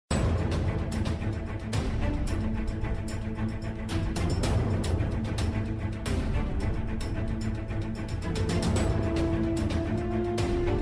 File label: Waging Battle; Soul Hunters; Android